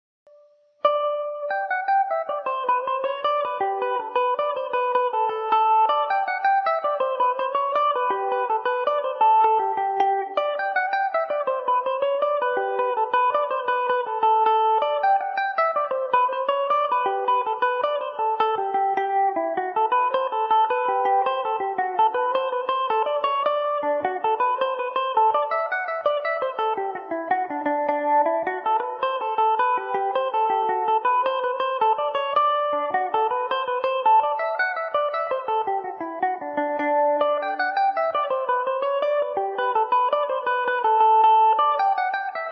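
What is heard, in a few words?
G major revelation, irish mandolin; O’Connell’s Welcome To Parliament; Revelation Jaguar electric mandolin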